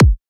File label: Synthetic Kick